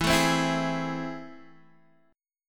A Minor Major 7th, E

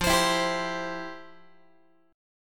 F Major 7th Suspended 2nd, F, down, Guitar

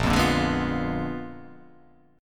A sharp 7th Flat 9th A sharp down

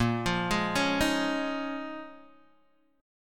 Bb Minor 11th, Guitar, Open D

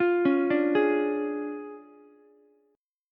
D Minor Major 7th Flat 5th D Ukulele; DmM7b5 arpeggiated; 10,13,10,11

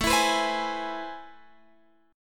A Minor Major 9th, down, Guitar, Open F; strummed; JGuitar

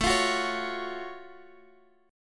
F sharp Minor Major 7th A

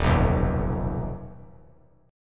D sharp Suspended 2nd Sharp 5th D sharp

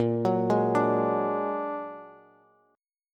Bb Augmented 7th Bb down Bass; Bb+7; arpeggiated; JGuitar

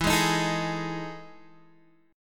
E Major 11th down Guitar DADGAD

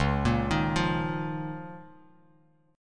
C sharp 6th Add 9th, Bass Standard; arpeggiated; C#6add9; JGuitar

